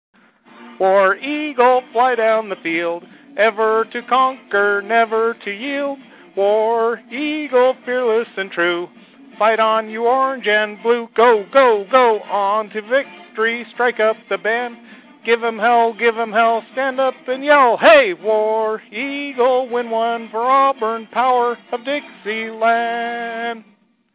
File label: auburn fight song